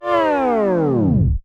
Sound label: Tape Stop